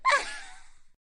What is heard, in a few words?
Wheeze